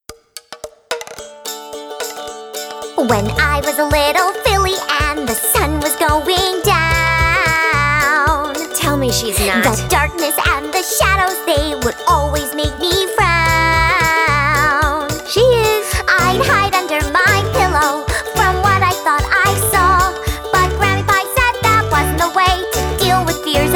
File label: The Laughter Song